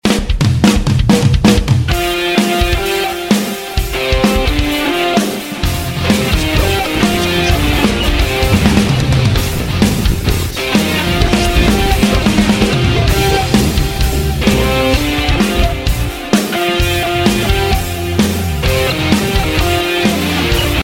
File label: rock